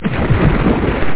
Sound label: SLIME; MRUWURM